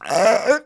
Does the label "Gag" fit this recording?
Yes